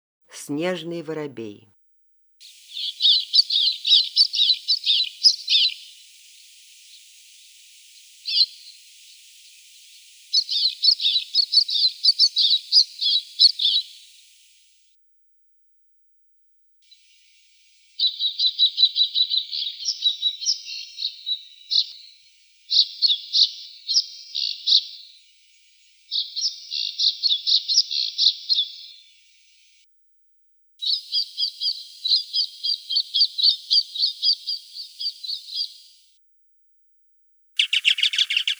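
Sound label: white-winged snowfinch voice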